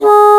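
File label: Bassoon p G#1; Digital Sound Factory Virtuoso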